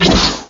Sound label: zoom a fast